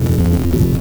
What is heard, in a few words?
laser burn